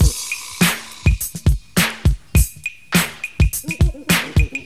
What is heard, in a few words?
jazzy sensation, funky drum loops